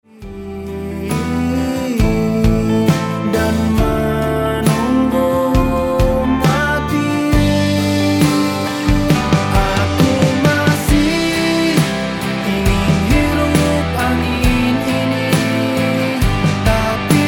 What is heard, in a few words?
Alternatif Rock